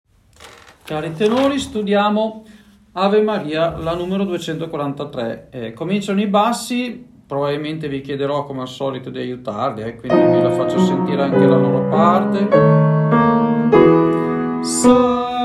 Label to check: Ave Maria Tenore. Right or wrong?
Right